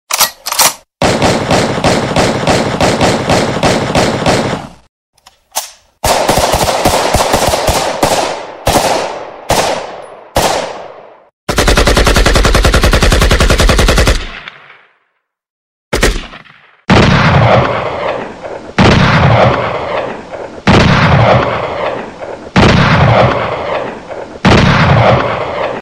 gun ringtone gun firing ringtone gun firing sound ringtone